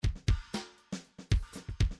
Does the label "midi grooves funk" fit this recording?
Yes